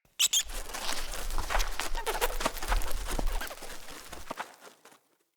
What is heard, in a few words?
Messenger Rat Burrow; Ambient; Foley; Verminia